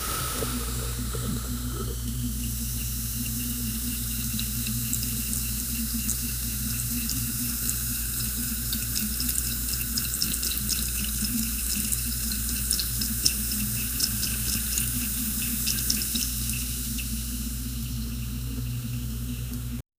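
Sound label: long intensifying diarrhea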